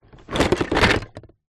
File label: leisure toy train wooden track pieces in plastic box movement